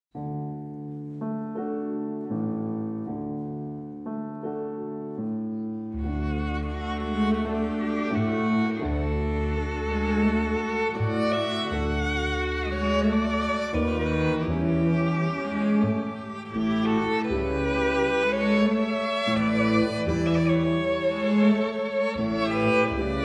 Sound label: Port De Bras Tango, exam music